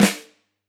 K1 close Flam, drum

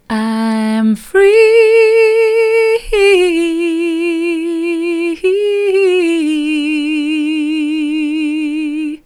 i'm free soft, vocal

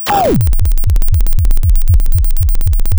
pop

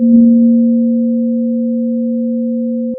Sound of a church bell

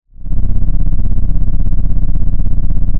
Sound of an engine revving

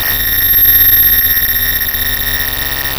Sound of a cat meowing

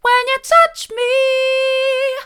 when you touch me mid, vocal

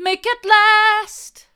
hard, vocal

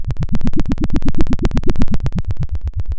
Sound of a helicopter

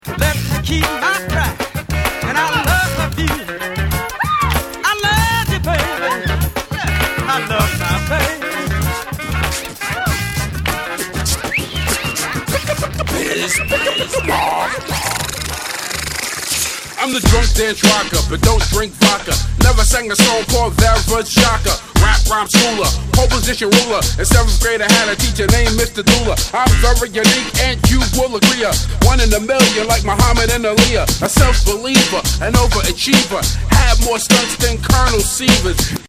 Funk To Rap

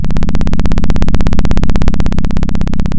Sound of a plane taking off

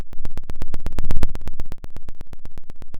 Sound of a dinosaur

